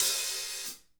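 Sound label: hat open close, drum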